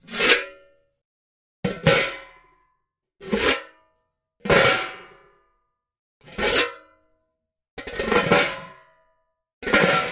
Pot Saucepan Lid Open; pots & pans

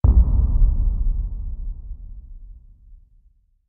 Bass Impact Low Drum; impacts

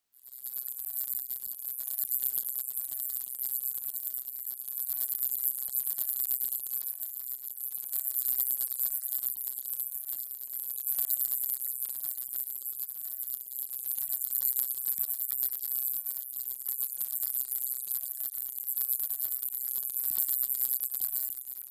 Elect Energy Field, buzz

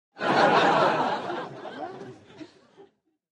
Crowd Short Laughter, medium

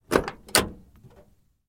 Camaro Door Open Clos, chevy camaro

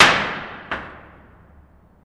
Jail Door Hit Clunk; jail & prison doors

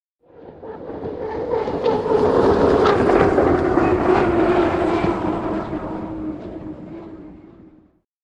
Hydroplane By Fast

